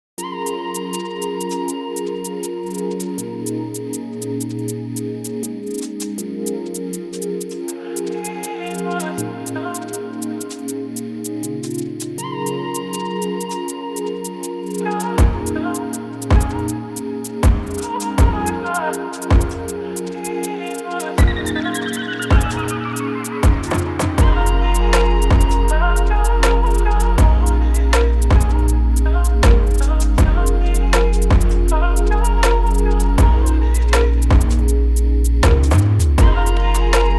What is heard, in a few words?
Dreamy Noise, Instrumental